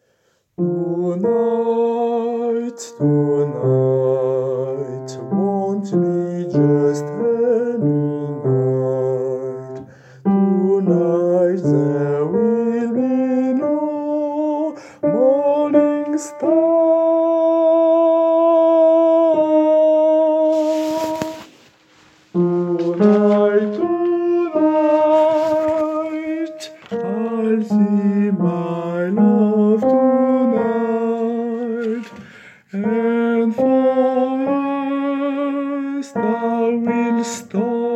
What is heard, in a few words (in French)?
ténor